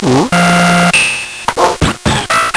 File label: Nintendo Gameboy; LSDJ